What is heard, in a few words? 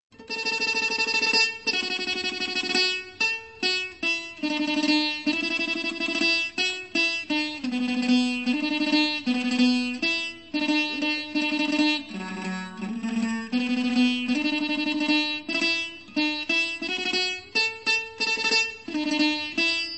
Classical Music of Thailand; SOUTH EAST ASIA